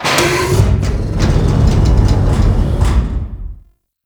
Airlock Open; Corpus

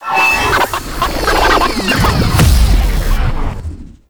Holographic Illusion Ring Ability Cast; Infested Lich; DJ Rom

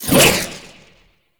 Crack Retract; Warframe; Warframes; Khora

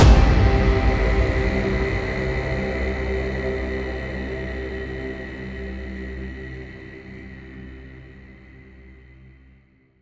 Combat Cue End; Entrati; TennoCon 2023; Quest MITW

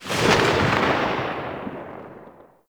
Uzi Tail; Warframe; Tenno; Prime Tenno Uzi; Weapons

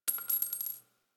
Target Practice Shell Casing F; gameplay; Warframe; Nineteen Ninety Nine; Quincy

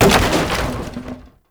Wood Fence Impact; Warframe; Ambience; Nineteen Ninety Nine

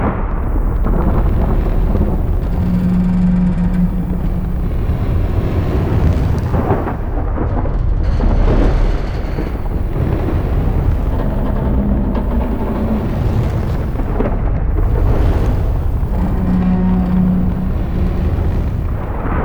Void Dragon Distant Loop; Warframe; Duviri

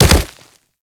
Kubrow Land Dirt, Footsteps; Enemies; Warframe

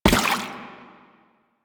Step Water, Footsteps

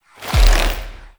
Drifter Pull; gameplay; Warframe; NineteenNinetyNine; Stage12PartyOfALifetime

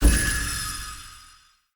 Mod Pickup

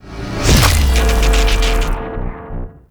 Backstab Cast; Infested Drillbit; Warframe; Infested Lich

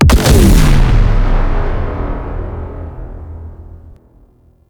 Obelisk Blast Blue

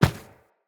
Infested Medium Run Default, Footsteps

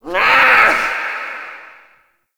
Ambient Meow Far; Characters; Warframe; Kalymos

